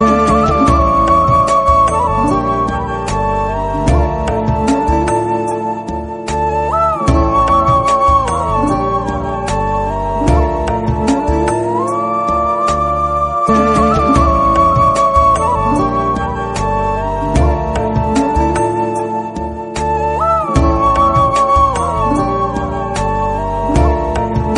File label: sad romantic